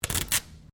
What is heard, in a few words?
Sticky Tape Rip Pull; common tape; Offices